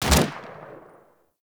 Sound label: Fire Shot; Warframe; Weapons; Braton MK One Redux